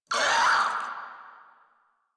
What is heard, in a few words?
Infested Crawler Pain; Warframe